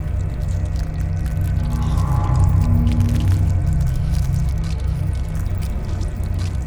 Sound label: kaiju egg destroyed loop; biogenesis